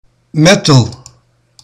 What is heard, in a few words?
metal